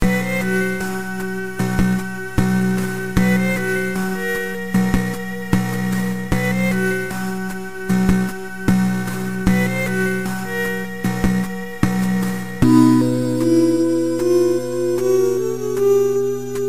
creeping silence ringtone

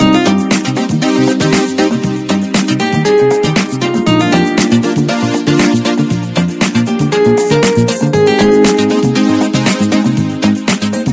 latin background music tone ringtone

